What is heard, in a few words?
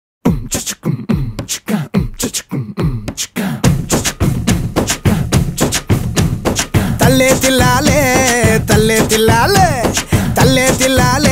Rustic Folk Song; Viswasam (2019)